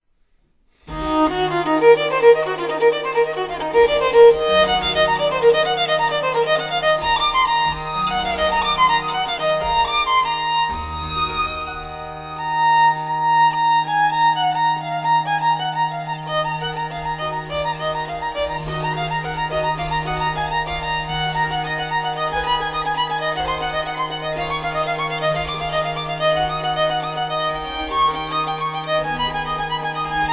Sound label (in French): Sonates en mi mineur Allegro; BWV1023